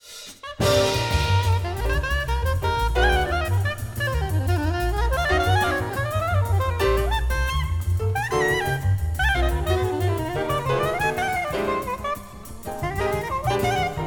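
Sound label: soprano, saxophone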